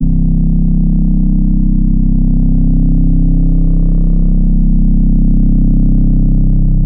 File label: phonk reese bass; outlier; phonk toolkit; rocket powered sound